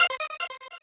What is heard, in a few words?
normal hit normal